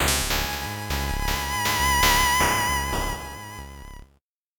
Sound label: 8bit jingle slow